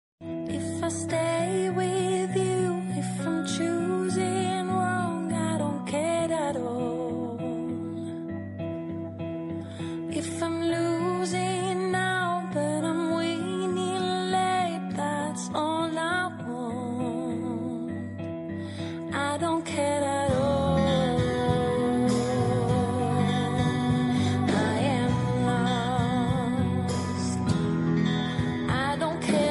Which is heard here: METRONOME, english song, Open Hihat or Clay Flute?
english song